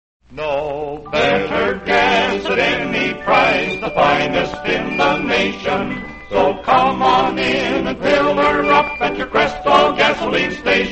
radio jingles; crest oil; allied radio artists

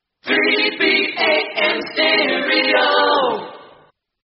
jingle; australian radio 3ba

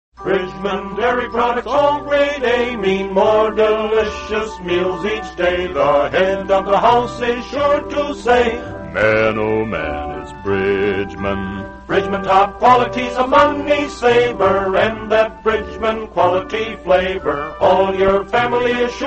radio jingles; bridgeman dairy; allied radio artists

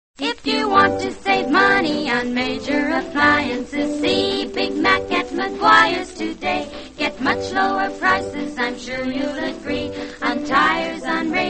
radio jingles; maguires appliances; allied radio artists

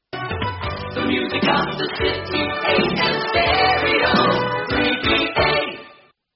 jingle; australian radio 3ba

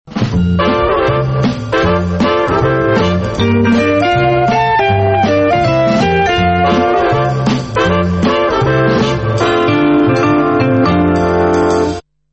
instrumental lead in; australian otr promos and idents; 3aw

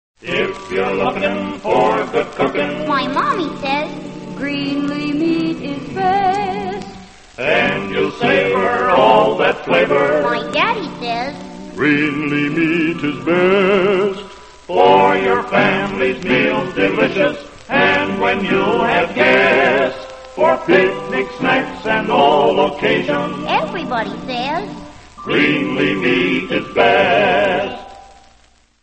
radio jingles; greenlee meats; allied radio artists

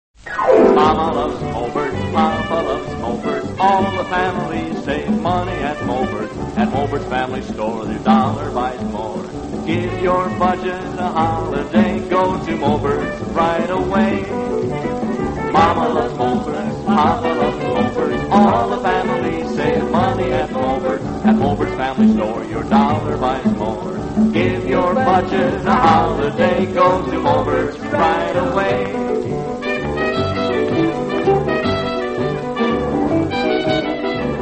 radio jingles; moberts; allied radio artists

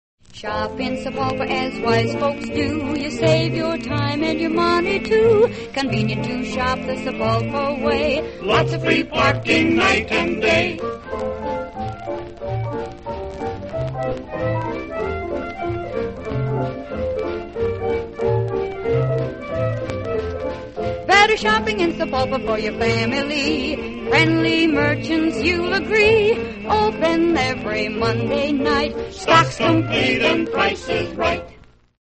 radio jingles; sapulpa; allied radio artists

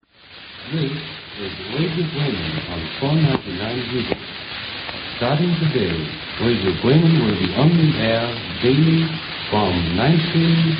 radio news interval signal; radio bremen

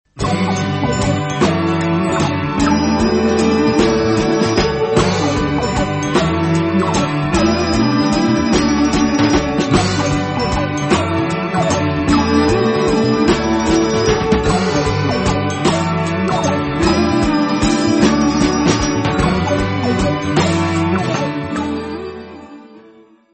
instrumental theme; australian otr promos and idents

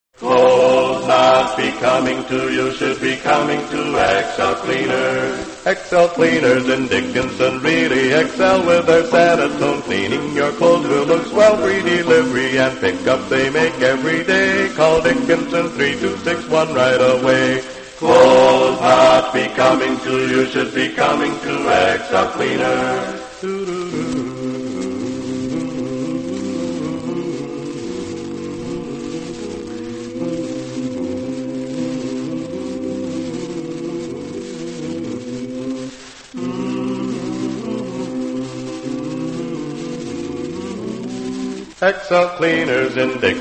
radio jingles; xl cleaners; allied radio artists

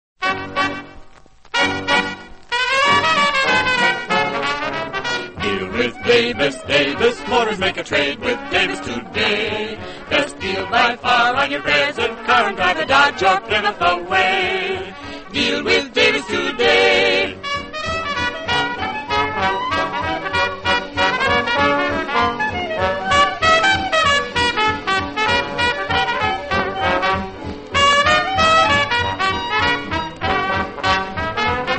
radio jingles; davis motors; allied radio artists

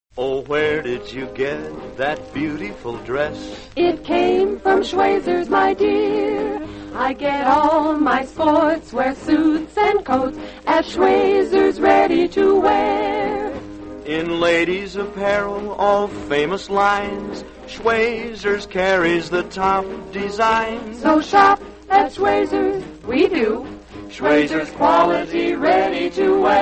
radio jingles; schwesers; allied radio artists

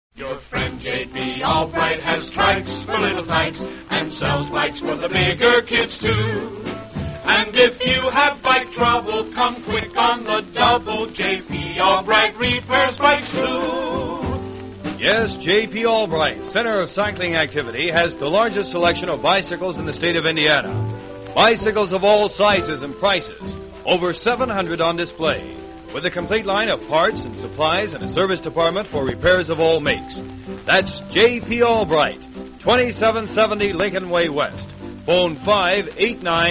radio jingles; jp albright; allied radio artists

